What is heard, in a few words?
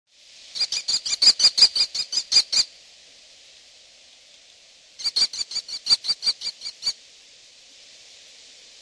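american kestrel; american kestral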